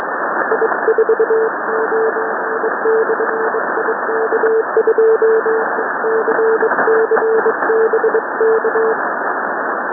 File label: sending random prefixes